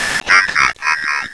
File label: drone fly online, weapons